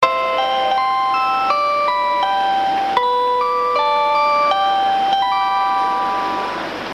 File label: train chime; スーパー白鳥; ハイケンスのセレナーデ; ７８９系のチャイムです。; チャイム１〜４は車掌放送用です。